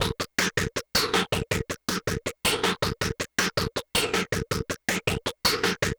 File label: Noise Beat; Drums & Drones